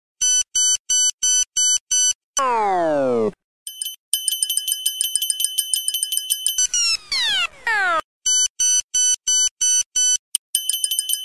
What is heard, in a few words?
Iphone alarm clock